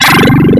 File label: Startup; SkySoldier